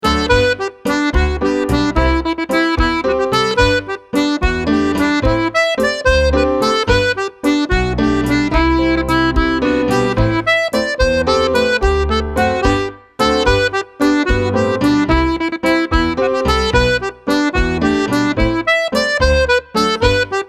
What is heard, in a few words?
Treble Jig